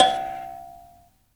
PLK BUZZ, Buzz Kalimba, Africa; Roland LCDP11 Africa VOL-1; Buzz & HiBz

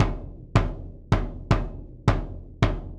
Big Bass Drum; Sonic Foundry (Sony Creative Software); Joe Vitale Latin Percussion

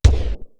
kooky kick; East West; Global Sounds

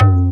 Tabla Hit; Ambient Grooves

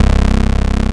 BASS L, Drum & Bass 160 BPM

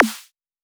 serum snare

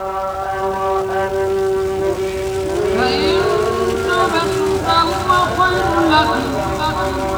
ambience

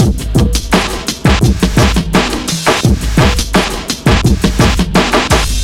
hardcore, 85bpm

